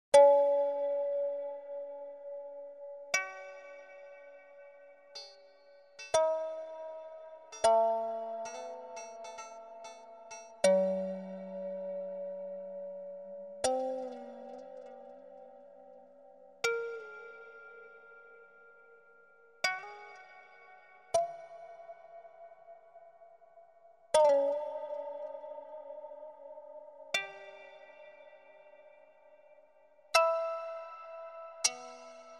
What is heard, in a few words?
morning birds